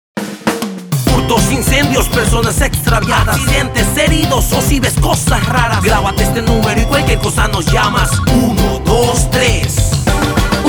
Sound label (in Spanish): Jingle; Linea 1 2 3; 20 seg con Pata